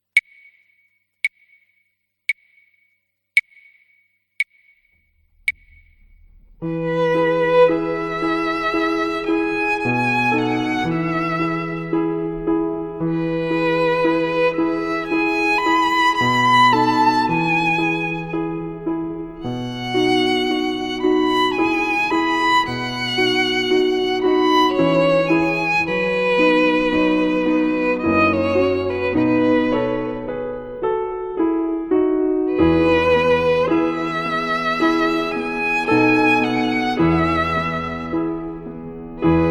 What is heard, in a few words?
Adagio Clarinet Concerto; Mozart